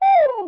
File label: orc baby damage